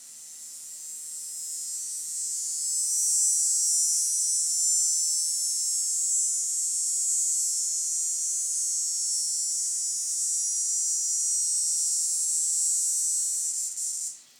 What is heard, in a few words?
cicada